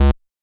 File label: bass